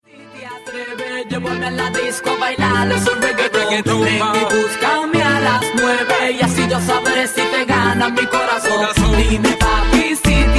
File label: best raggae tone; reggae